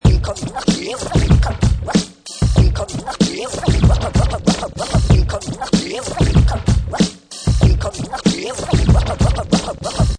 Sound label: Scratch Bass 95 beat, Professional killer vinyl scratch on top of hip hop beat; Dj Sound Effects; perfect for sampling, mixing, music production; 95 bpm